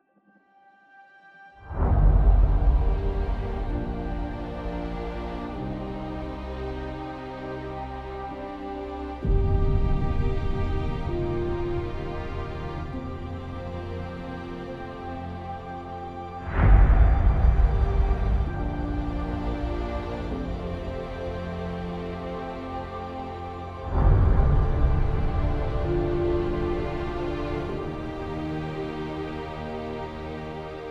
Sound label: string theme with atmospheres and impact